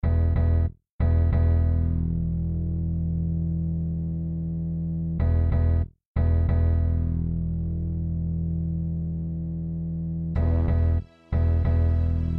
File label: Catacomb Music; Demon's Tier; PS Vita PS4 PS5 Switch Xbox One